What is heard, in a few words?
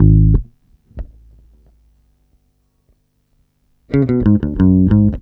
bass, Roots Funk; USB Soundscan vol.41; AKAI